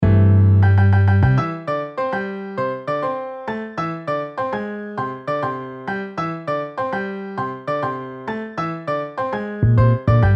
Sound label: Piano Bass